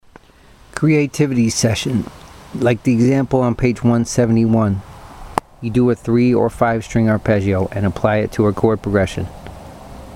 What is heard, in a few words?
3 or 5 string arpeggios